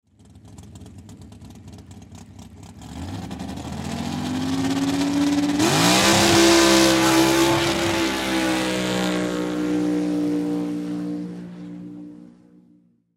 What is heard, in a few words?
Swamp Buggy Race Start; Transportation Watercraft; Boat Sound Effects; Boats (Sound Effects:Vehicles); Blastwave